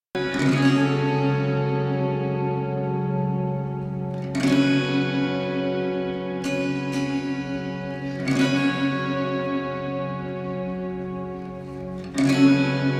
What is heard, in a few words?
Boon Menu Music Loop; Warframe; UI; Duviri